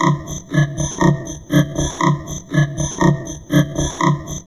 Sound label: VOCOD, Drum Loops Crazy Processed